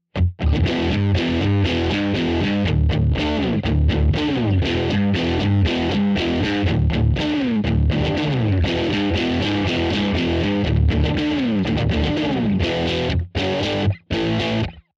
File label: Roots Rock